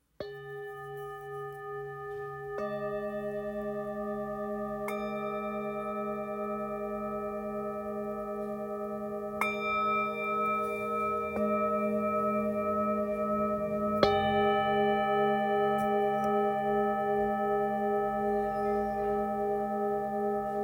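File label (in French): Set precision, bol chantant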